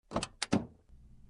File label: open door